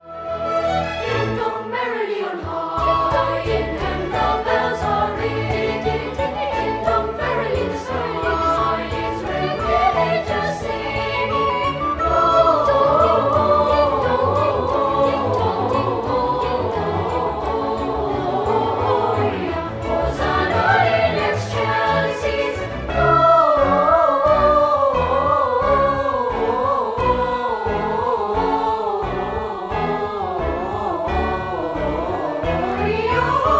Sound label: Ding Dong